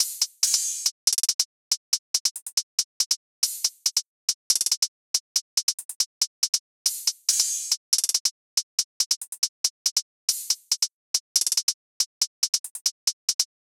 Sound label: Hihat Loop 140 BPM; Cymatics